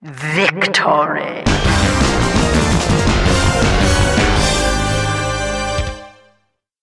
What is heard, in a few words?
victory; bloody roar 2